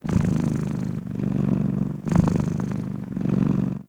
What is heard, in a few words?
Kitten Purr; Warframe; Catbrow